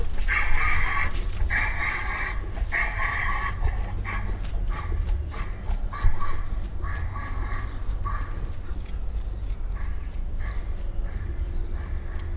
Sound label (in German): Kommunikation Nashoerner Pant; Breitmaulnashörner (Ceratotherium simum simum)